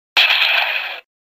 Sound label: whistle; バントナルA音